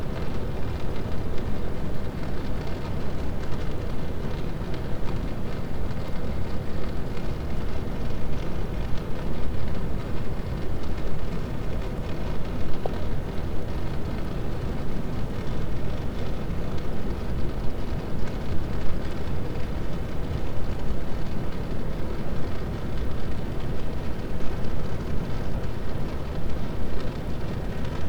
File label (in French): cachalot positif, Physeter Macrocephalus